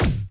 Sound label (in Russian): odinochnij pistoletnij vistrel s glushitelem